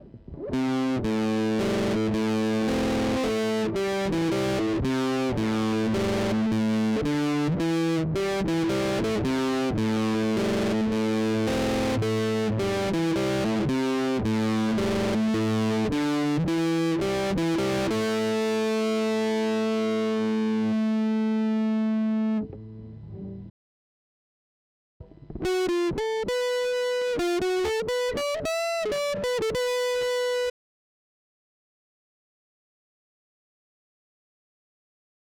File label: fuzz